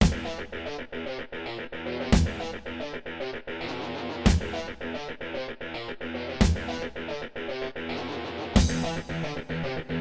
yamaha db50xg; soundcard test; Doom

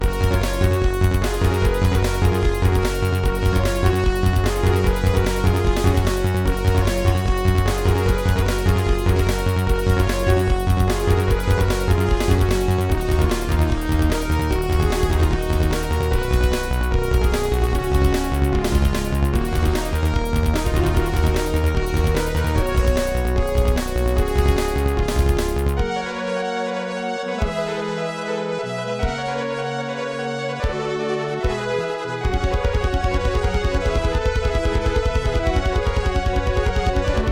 ensoniq elite; Ensoniq Sounscape Elite; Soundcard test; tyrian

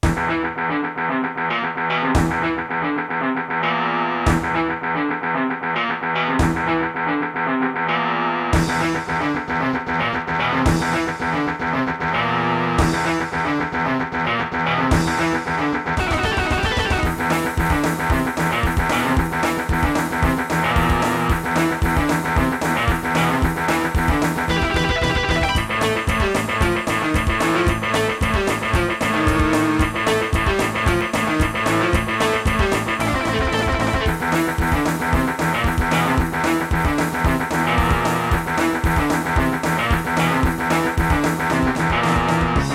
gus pnp; soundcard test; Doom; Gravis Ultrasound PNP (external player and utopia)

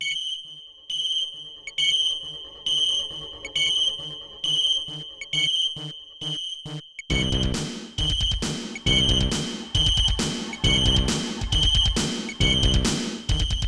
intro, guillemot maxisound64; Guillemot MaxiSound 64 Home Studio Pro; Soundcard test

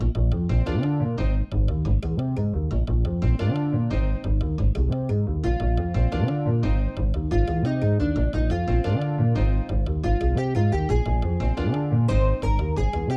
level1 roland rap10; soundcard test; Hocus Pocus

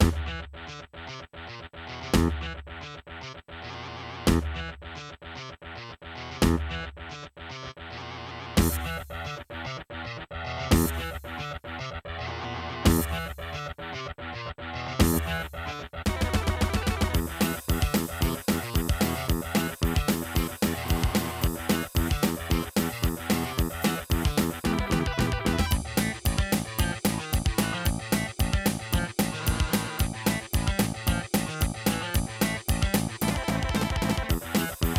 acer magic s23a; soundcard test; Doom

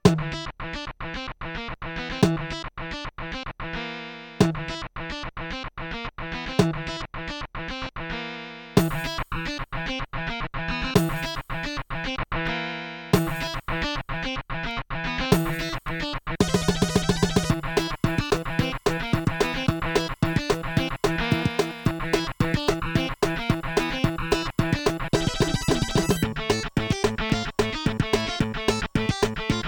nvidia nv1 gm; Nvidia NV1 (GM mode); soundcard test; Doom

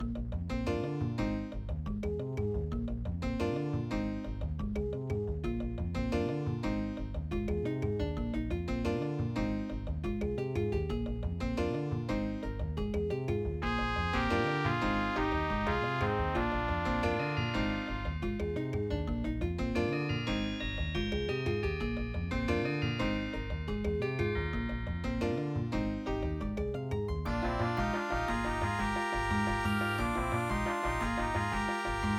level1, AZTech SoundGalaxy Waverider 32 Plus; soundcard test; Hocus Pocus

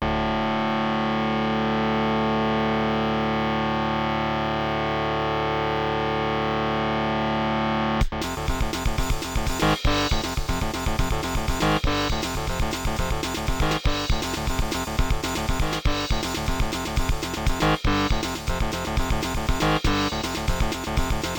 orchid sw32; Orchid SoundWave 32 with invision rom; soundcard test; Doom

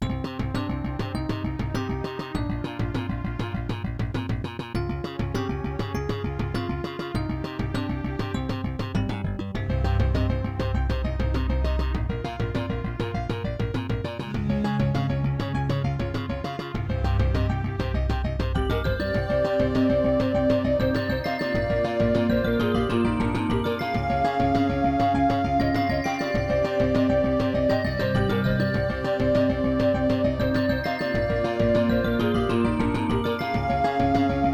intro, turtlebeach maui; soundcard test; Hocus Pocus

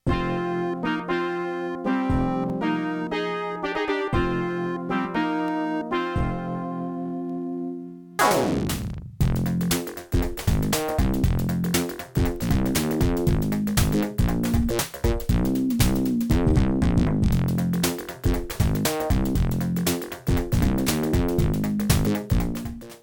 sb 20 ct1350b; Creative SoundBlaster 2.0 ct1350; soundcard test; Lost Vikings; Soundcard test page

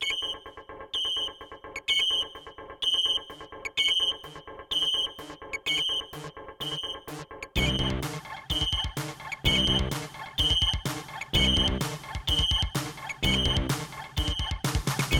intro, roland scc1; Soundcard test

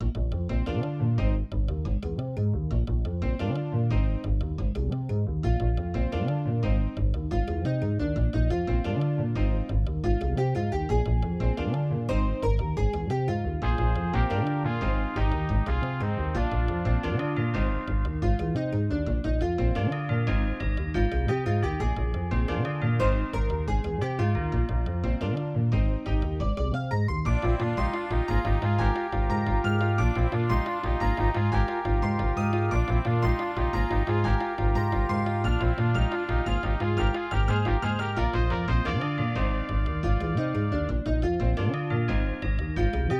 level1 roland sc55; soundcard test; Hocus Pocus

